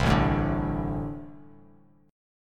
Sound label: A-Minor Double Flat 5th A Bass Standard; strummed; JGuitar